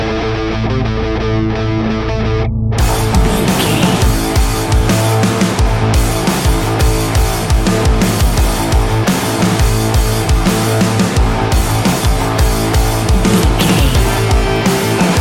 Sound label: A huge and aggressive piece of classic Heavy Metal music. Very heavy distorted guitars, powerful drums